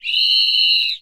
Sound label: Whistle Dual Pitch; SFX Kit; Foley - Misc